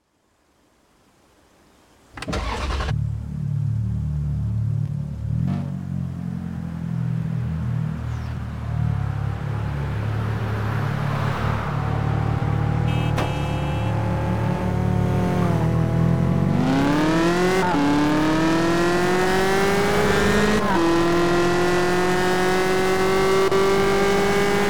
ferrari 430 scuderia; tdu

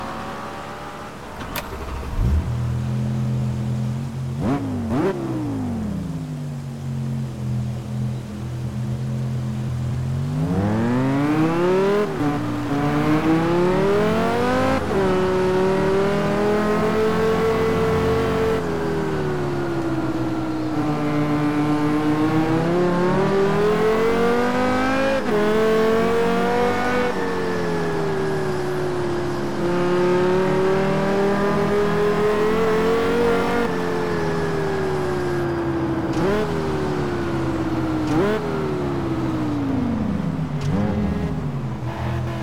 bmw m5 e60; 2009; BMW M5 Touring [E61]

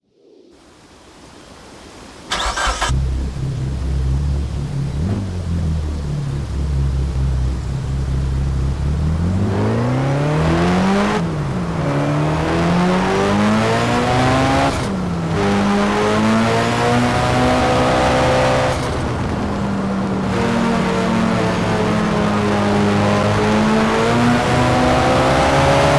mitsubishi lancer evolution 8; 2004; TDU-1

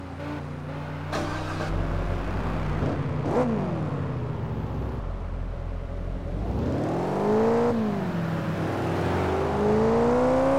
chevrolet corvette c6 convertible; TDU-1

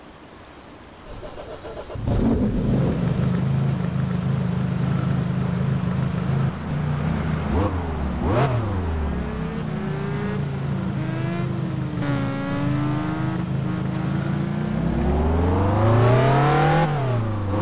bmw m3 e30

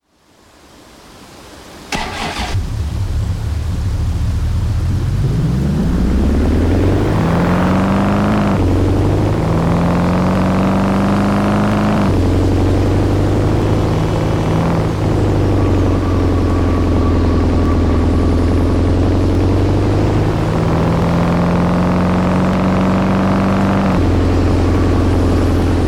saleen s331 supercab; TDU-1

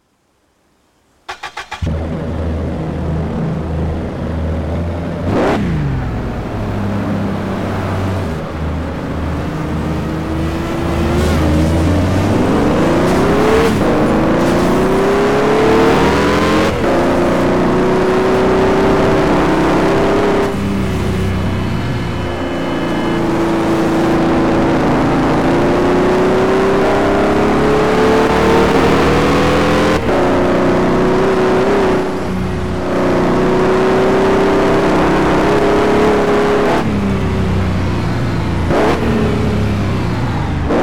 bmw m2

